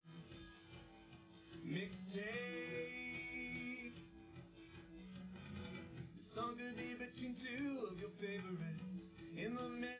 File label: drums live rec